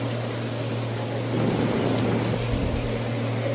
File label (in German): stein verschoben; We Were Here